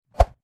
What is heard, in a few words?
Transition